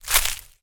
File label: foley footstep dried leaves brush